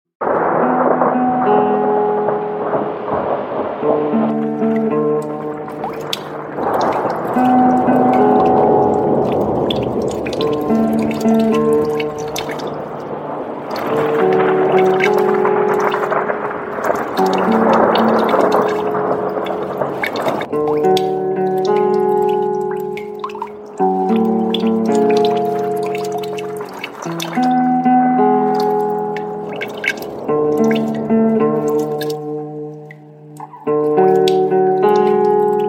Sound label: Instrumental